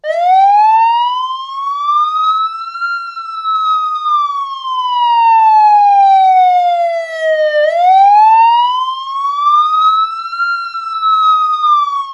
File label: wail; Tomar Electronics